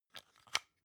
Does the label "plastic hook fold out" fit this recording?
Yes